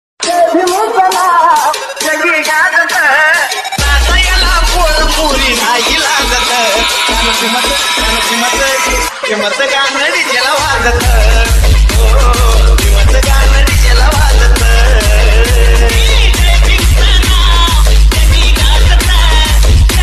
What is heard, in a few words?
song ringtone